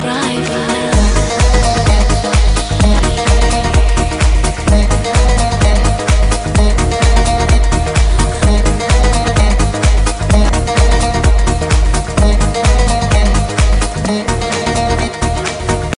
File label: instrumental, ringtone; blues